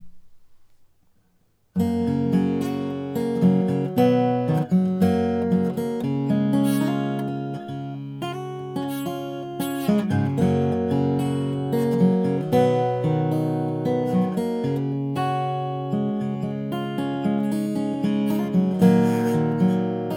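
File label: pick right, hsguitar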